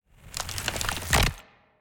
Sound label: Earth Sphere Effect; TANK; 3Souls